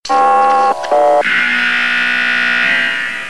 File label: Cool Sony Tone